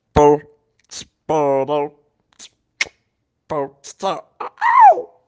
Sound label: beatbox; keyboard dog